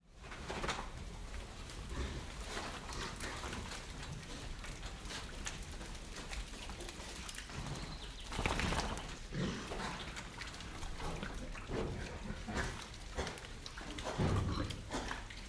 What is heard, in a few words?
pigs movement wet mud inside shed